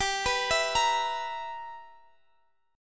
B Major 7th Suspended 4th Sharp 5th G

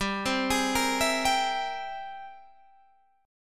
G sharp Augmented Major 9th G, guitar

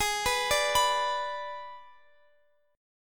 G sharp Diminished G sharp, Guitar Open F; G#dim Chord

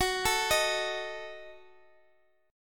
D sharp Diminished

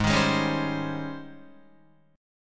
G sharp Major 11th G sharp, Guitar; JGuitar

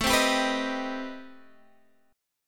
C Minor Major 7th, A, chord

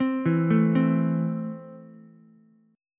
C 6th C down Ukulele; C6 arpeggiated

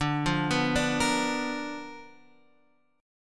B Minor Major 7th Flat 5th D, chord

